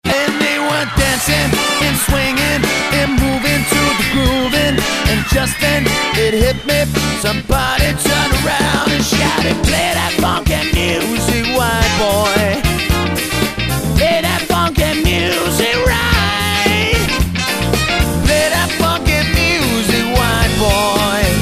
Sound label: Electric Band Funky Music